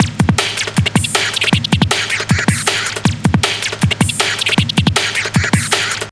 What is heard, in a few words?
drums, Hardcore Breakbeat, 157 Dmin; Big Fish Audio - Hardcore Breakbeat Action; Disconnected